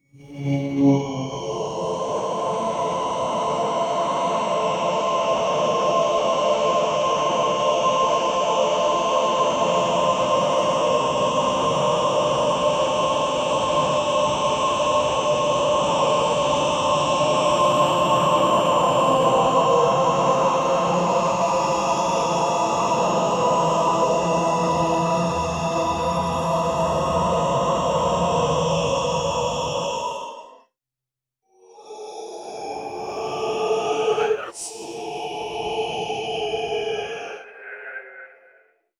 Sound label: true asmr infrasound ultr; demonic